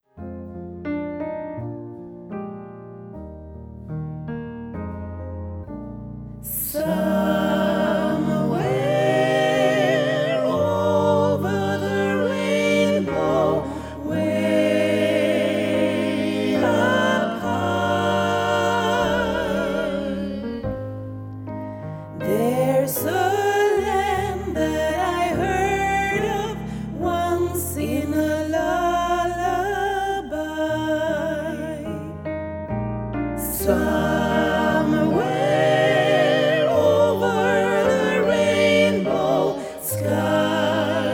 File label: SATB